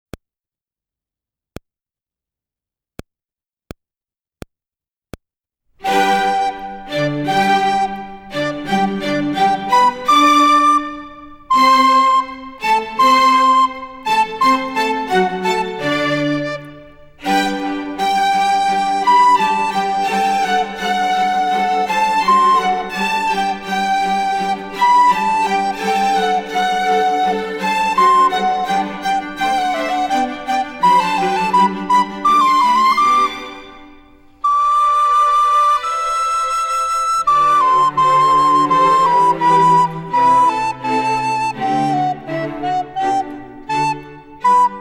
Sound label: Little Recorder Music slow